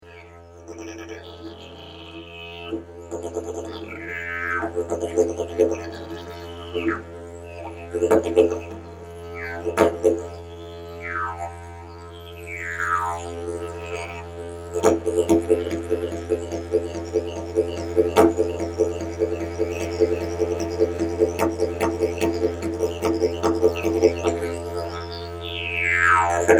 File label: Didgeridoo